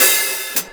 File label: Hihat, Hip-Hop, Drum Kit